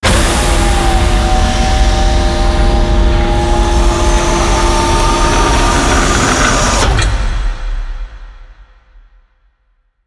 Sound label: Elevator; grsn Nexus